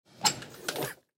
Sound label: Ambulance Bench Metal Open Lid; Emergency